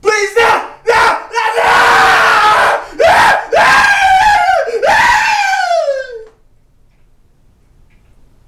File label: Please No Scream